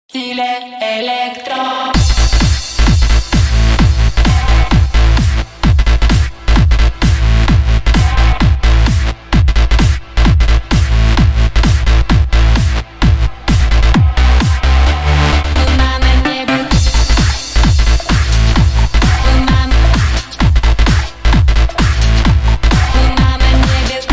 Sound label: Electro